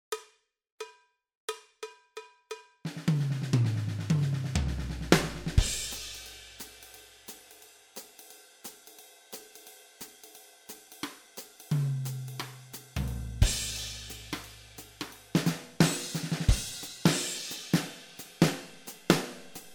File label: Jazz